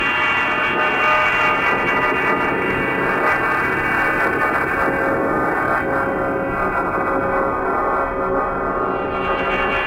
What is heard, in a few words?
Monster Loop